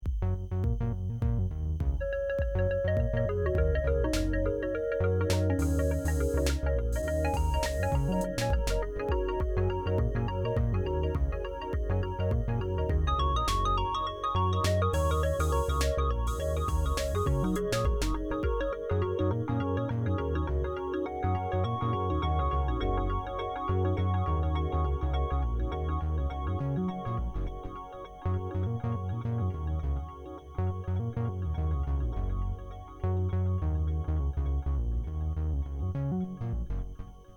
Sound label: ensemble sync